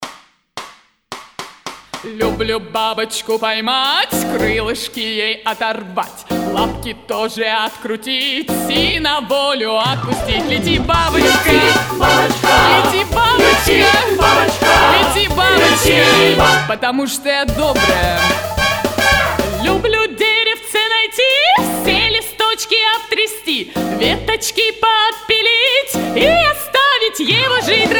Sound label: rock n roll